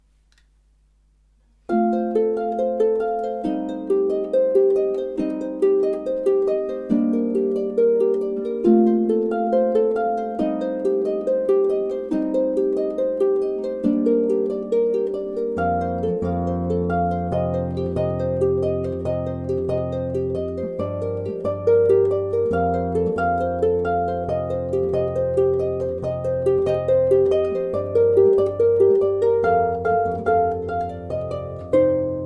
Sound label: played on harp